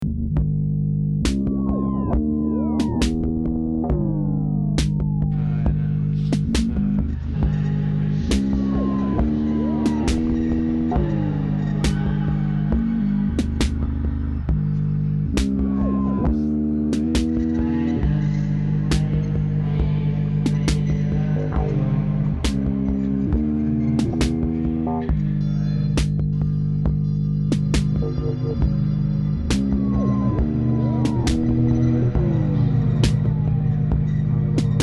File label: amb